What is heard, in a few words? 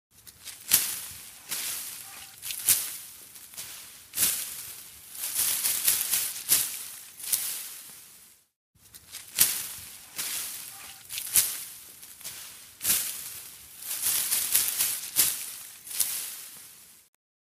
Tree Branch Shaking Sound